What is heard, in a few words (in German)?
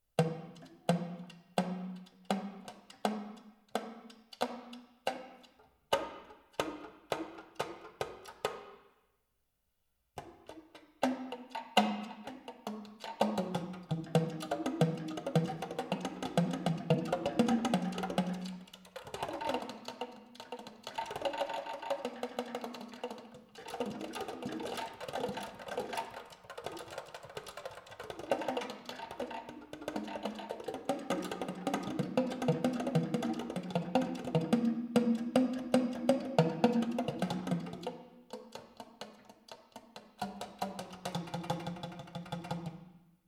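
alt, Klappenperkussion, Tiefster Ton b bis e1 (angeschlagen mit der F-Klappe); f1 bis h1 (angeschlagen mit der H-Klappe); freies Spiel mit Klappen (verschiedener Dichte). Alt; saxophon; spieltechniken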